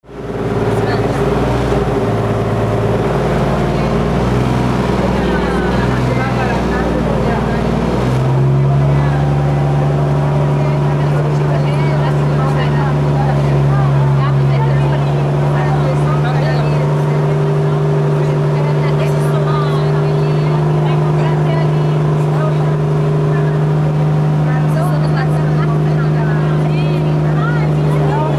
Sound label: ferryboat in bangkok